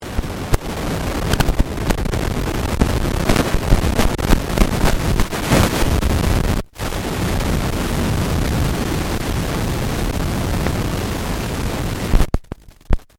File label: ELECTRONIC NOISE CLICKS POPS; Electrical Buttons; Sound Effects:Multimedia